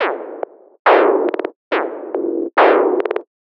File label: Loop